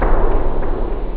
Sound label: echo, rave; OctaMED SoundStudio V1